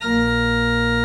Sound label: Church Organ A#2; Best Service; Advanced Media Trax Modern Composer